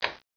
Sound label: BUTTON